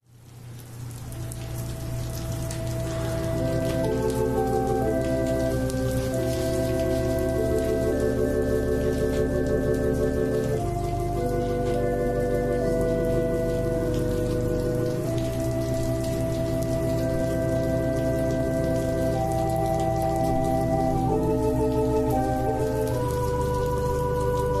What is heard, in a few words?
Organ prelude, Worship